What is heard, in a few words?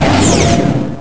Dark Blast Hit; Vaati's dark blast hitting something